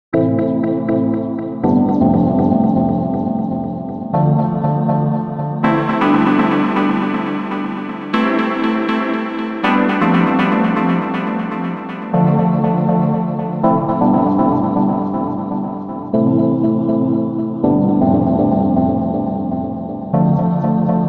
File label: dual delay cross feedback